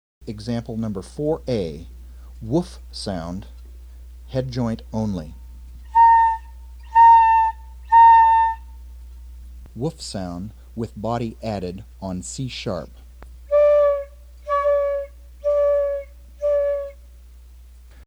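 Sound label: Embou FL, Flute; WHOOF SOUND; No tongue (Too) has been used to push air out.; Eject air with tongue on roof behind teeth – "too" part of embouchure.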